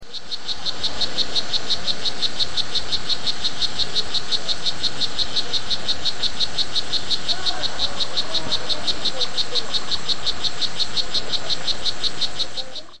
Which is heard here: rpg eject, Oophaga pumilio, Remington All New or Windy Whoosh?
Oophaga pumilio